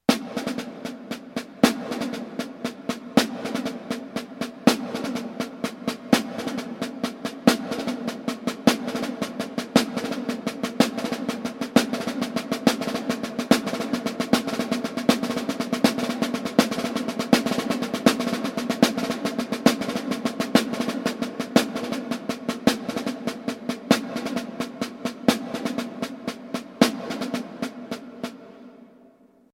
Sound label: drag paradiddle